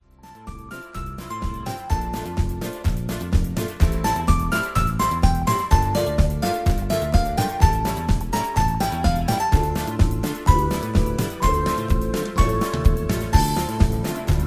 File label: Patter